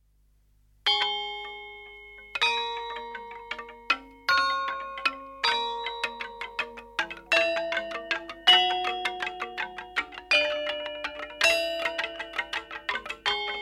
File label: gambang; Asak; Ranggacalon